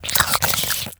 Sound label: attack slobber, headcrab, creatures; headcrab classic; vox